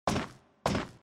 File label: male walk